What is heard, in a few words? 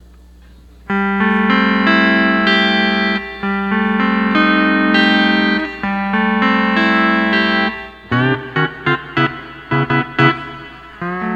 12 String Lap